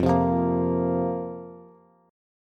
F Suspended 4th F, Bass; Fsus4 strummed; JGuitar

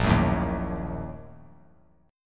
A sharp Major 7th Suspended 4th Sharp 5th; strummed

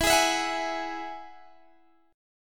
E Major Add 9th E, Guitar, DADGAD; chord shape

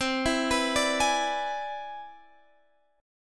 C Augmented Major 9th, Guitar, Standard; arpeggiated; JGuitar

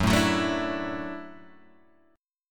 F sharp Minor 7th Sharp 5th, Guitar Open G; chordshape; down

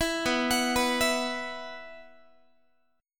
B Suspended 4th, Guitar; Bsus4; arpeggiated

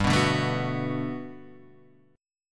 D 6th G, chord; D6/G strummed; JGuitar

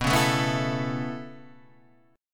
B 11th Suspended 2nd, guitar chord; B112 Chord {7 4 7 4 5 5} strummed

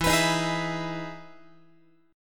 E Major 7th E down Guitar; EM7 strummed

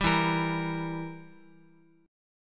E Diminished E, Guitar; Edim strummed; JGuitar

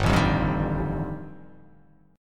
G 7th Suspended 2nd Sharp 5th G chord

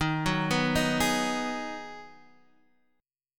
Ab Minor 7th

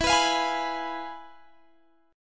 F Diminished E; strummed

